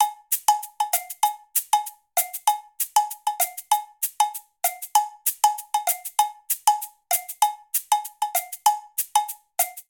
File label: Pop Cabasa Cowbell 97bpm; Latin Percussion; Ableton; Factory Packs